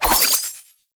Spectral Sword Slash Start; Ultimate Abilities; Naramon; Warframe; Focus

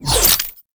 Pistol Projectile Pass By; Weapons; Warframe; Tenno; Temple Signature

